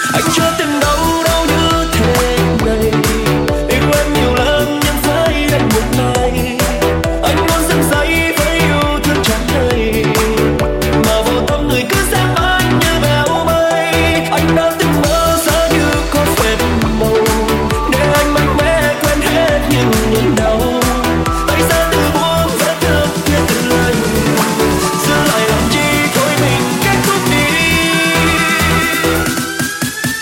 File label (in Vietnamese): Nhac Chuong Remix